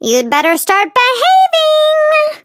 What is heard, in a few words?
flea hurt